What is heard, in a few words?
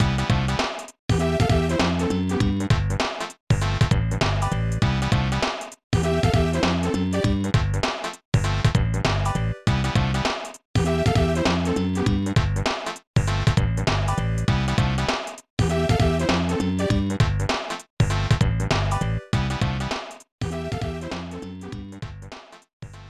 Dialogue; Final Stretch; SNES